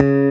bass

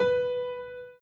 Piano Chord

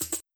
jungle break sequence

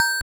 BELL

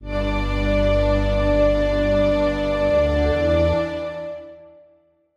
Strings

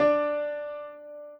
piano intro

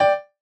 chorus chord G6 D6

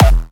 Hard kick

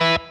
Staccato

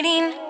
vocal

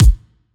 soft hit whistle

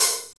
drum hit whistle

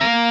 bend half

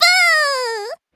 combo break; osu

